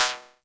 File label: effects, drum machines, FutureRetro FR777